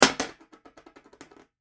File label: Bullet Shell; Bullet Shell 12 Gauge Drop Metal Surface; Drops (Sound Effects:Objects); Blastwave; Warfare